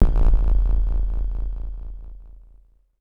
Tone, IDM; Sonic Foundry (Sony Creative Software); Twine-IDM

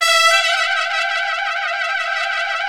BRS, TRUMPETS; Roland LA; Roland - LA Composer vol. 1

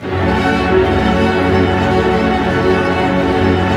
STR ARP MI, Symphony Orchestra, STR Arpegg. Minor

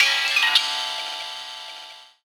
RAW JAZZ, Gbm; Rawcutz - Nu Forms

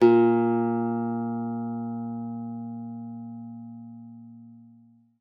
A#2, GUITAR; Xsample VOL-2

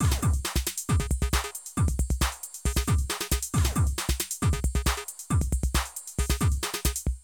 Drum loops, Euro techno

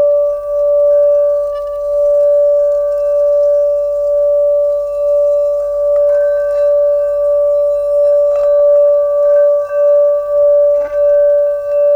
Singing Bowl; Sonic Foundry (Sony Creative Software); Continental Drift Aftershocks

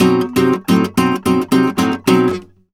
Flamenco Guitar Frase; Best Service ProSamples vol.46

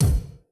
Kick, Yamaha RY20

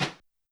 Brush Slap L; YAMAHA RM1X

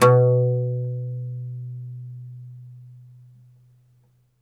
OUD B1 -L; E-MU Producer Series Vol. 7 – Old World Instruments

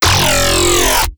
Carrier Bass F

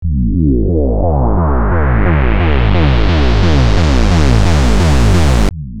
Sync Thumper; AMG - Prototype Drum & Bass

